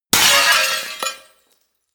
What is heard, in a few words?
glass smash